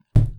Loud Thud

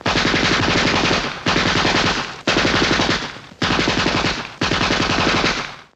Tommy Gun Busts Machine Gun

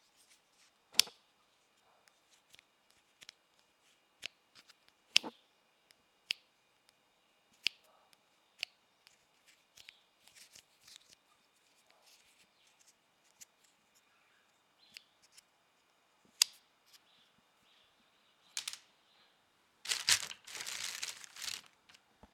Clothing Pin

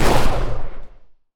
Shotgun Blast

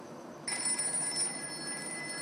Phone Rings

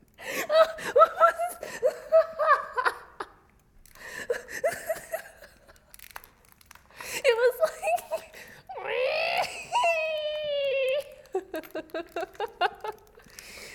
Girl laugh

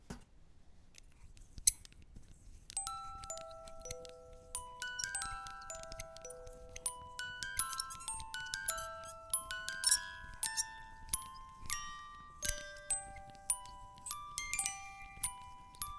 Music Box

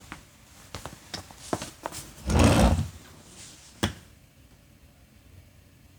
Moving Chair; Soundaction

